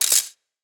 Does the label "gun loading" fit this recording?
Yes